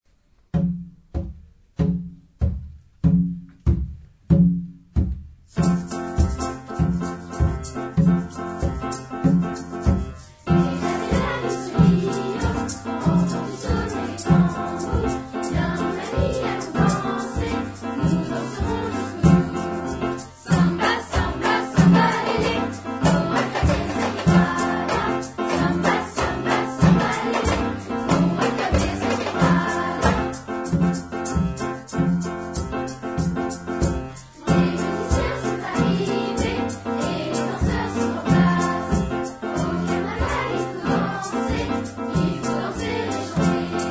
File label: samba lele